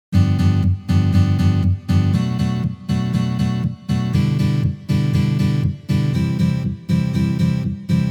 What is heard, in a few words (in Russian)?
Uprazhneniya akkord G7